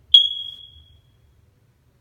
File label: African ceiling bird call